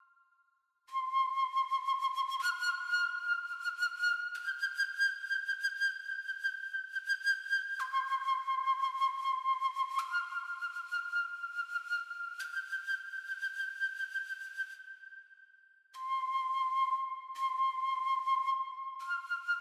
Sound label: native american flute